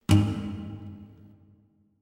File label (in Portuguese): violão grave curto